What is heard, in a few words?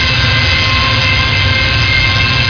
tie engine inside